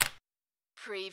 drop pen on desk

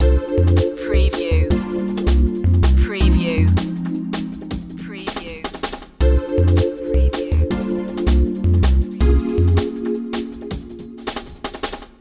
town beat loop

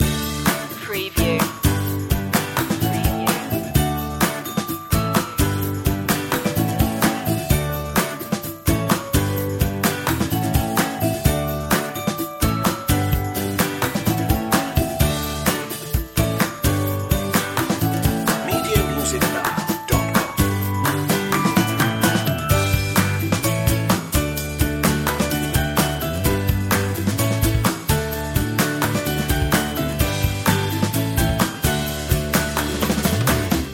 lucky loop

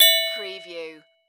Multimedia Bell

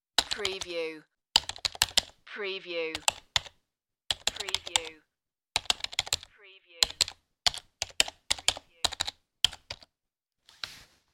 Computer Numeric Keypad Typing

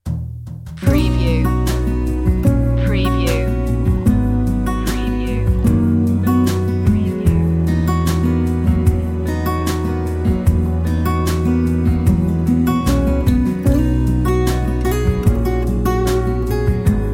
instrumental

